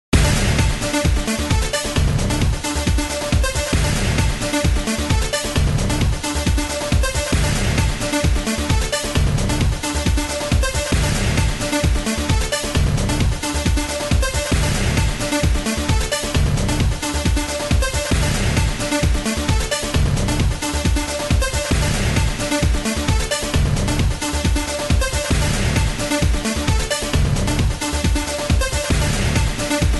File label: Loop